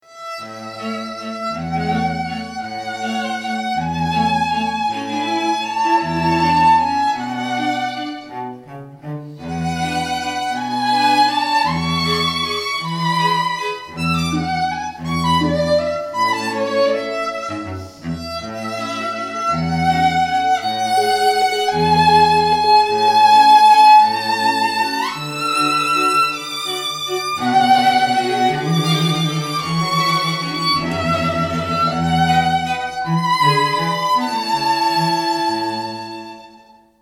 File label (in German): Klingelton Violine